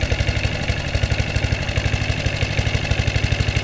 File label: boat loop; Wonder's Greatest Toyshop on Earth; OmniMedia